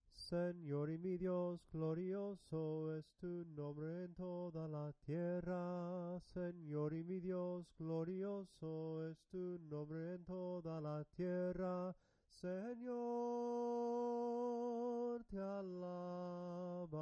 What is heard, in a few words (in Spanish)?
SENOR Y MI DIOS GLORIOSO ES TU NOMBRE SOPRANO CANTADO; cantos del camino